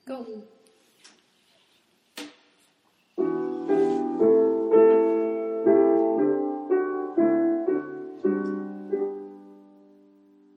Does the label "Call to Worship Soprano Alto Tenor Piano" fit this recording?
Yes